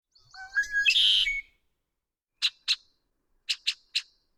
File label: red winged blackbird; ringtone